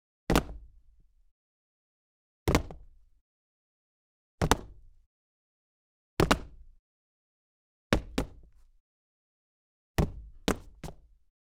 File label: Cement Dress Boots And Jumping, Footsteps